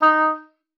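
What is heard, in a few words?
D#4, oboe d'amore; Xsample VOL-7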